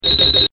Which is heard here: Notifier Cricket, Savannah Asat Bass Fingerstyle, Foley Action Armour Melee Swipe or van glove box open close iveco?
Notifier Cricket